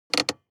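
transportation sports car interior handbrake pull medium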